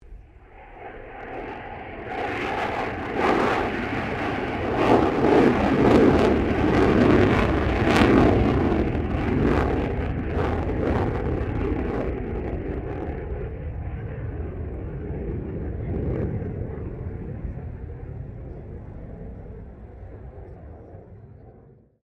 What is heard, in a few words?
F16 Falcon pass afterburner; Airplanes; Vehicles